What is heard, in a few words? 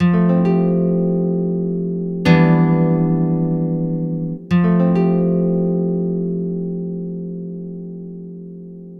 Nylon Guitar